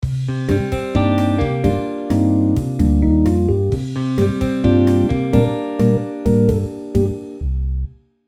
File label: Nostalgic theme sentimental sitcom music